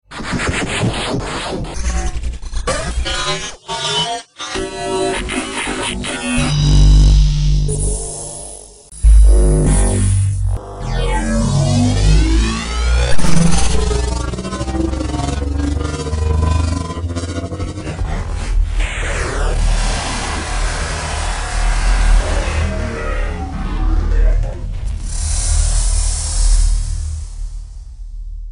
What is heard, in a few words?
Iphone Decepticons